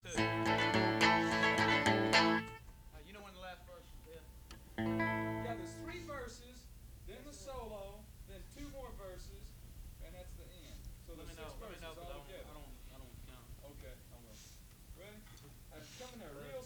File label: false start